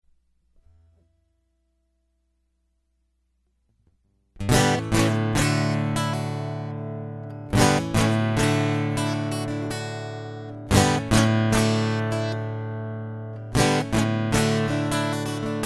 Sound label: Guitar Prelude